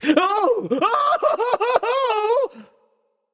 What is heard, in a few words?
nv fear; smite; voicepack; ganesha